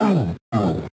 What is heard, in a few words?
Death; Joblin Joblifter